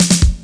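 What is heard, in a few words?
snare roll, Ragga & Dub Reggae; USB Soundscan vol.27; AKAI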